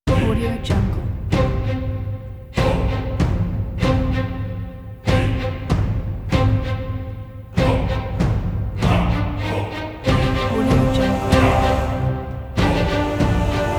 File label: epic heroic chinese trailer